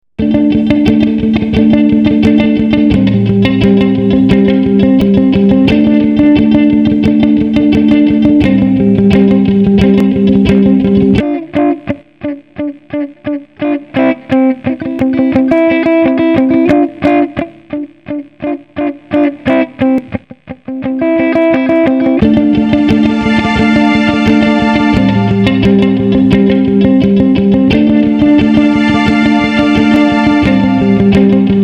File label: Running Waves